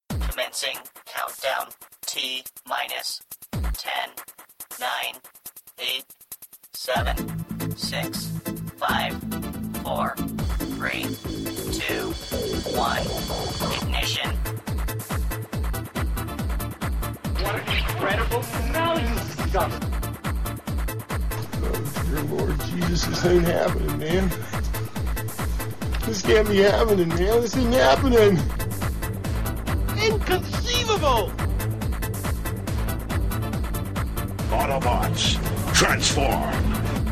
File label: Intro Music